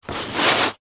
industrial sanding large piece of wood sand paper single